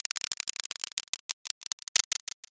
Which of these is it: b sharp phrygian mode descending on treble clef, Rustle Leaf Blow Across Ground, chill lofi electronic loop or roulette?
roulette